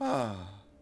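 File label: Longbowman Heal Vox; Civ4 warlords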